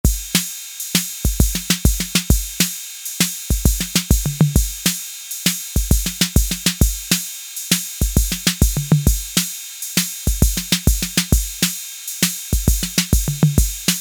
5 BEAT, Roland TR-808; Factory

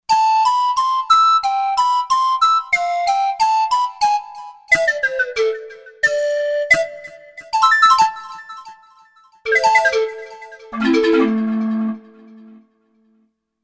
Metal Piper, synth

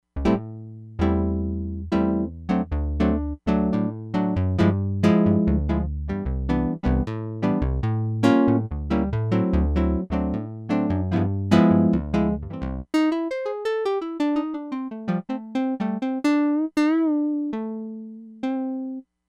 GUITAR, Crumar Bit 99; Factory Programs

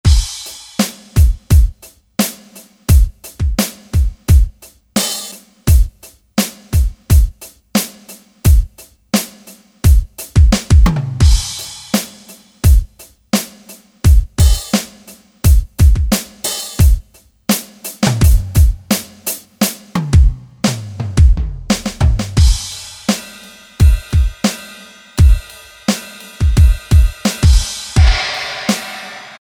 rock

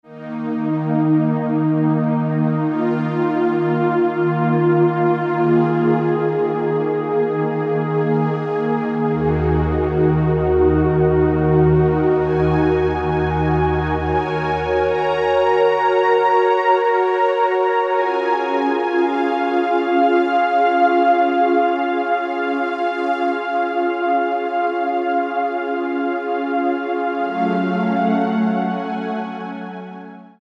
Strings Stack; Roland SC-880; Factory Patches

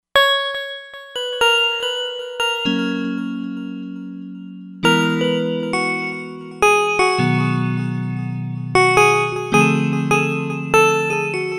Reece EP, synth

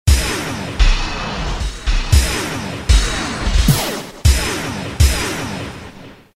Bang x2; Roland M-DC1

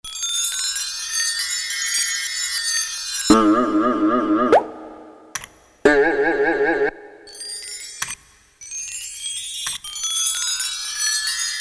Kit, Percussion; Korg DSS-1; Kit2Tree

